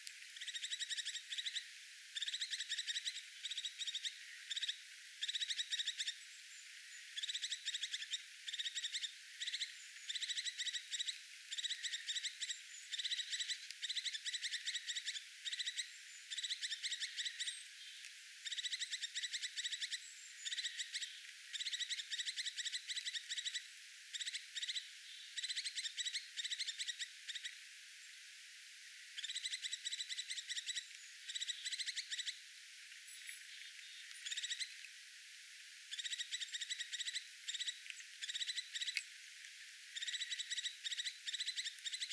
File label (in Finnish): toyhtotiaisen huomioaantelya